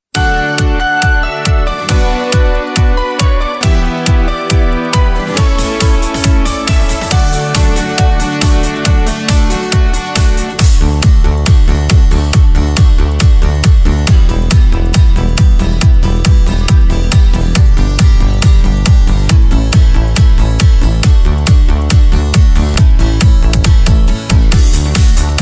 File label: Korg Pa3X